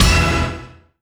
DANCE STAB; E-MU Formula 4000 Series Vol. 2

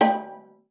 G5, VIOLA FINGER; Xsample VOL-5

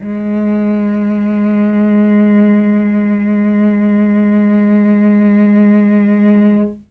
G#2 300 CELL, Mellotron, STRINGS; Mike Pinder - Mellotron Archive CD

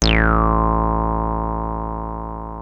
303 A1, TB 303; Hollywood Edge; Giorgio Moroder Rare Synthesizer Collection

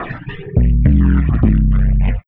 Metals Bumpers; Sonic Foundry (Sony Creative Software); Esoterik Beatz

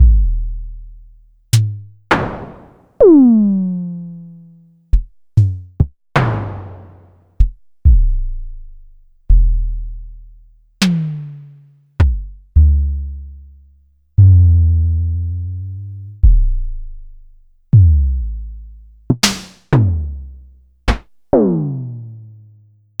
Pearl Drum-X, Samples Sliced; m8

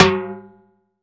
Timbale Hi, Roland BK-5; Percussion

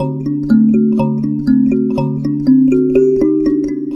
Kalimba, Ambient Melodics

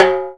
Mid Timbale Rimshot

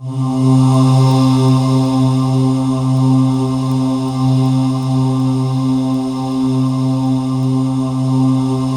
C3, Synth Leads

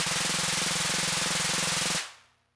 Snare Rapid Fire, wurlitzer; classic machines

